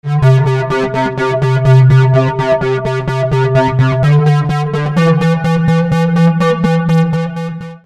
processed synth

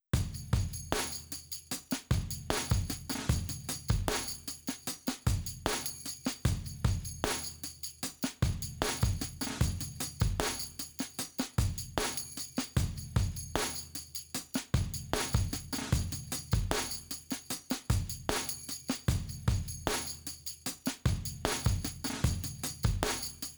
processed drums

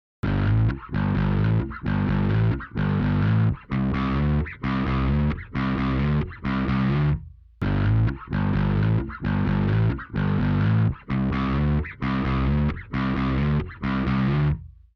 bass sans amp original

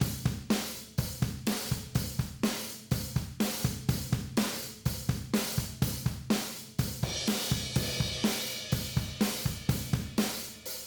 overheads and room original

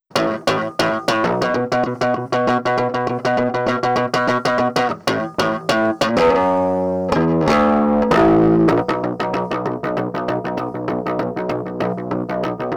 bass gtr cab processed

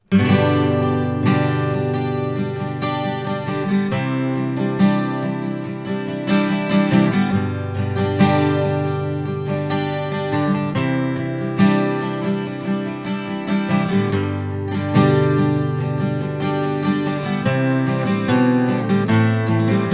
km84 guitar

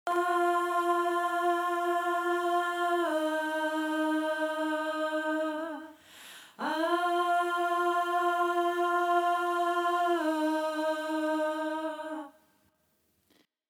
processed bv

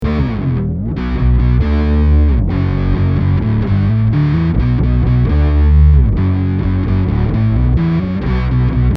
guitar bass processed

